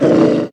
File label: wolf bite miss; wesnoth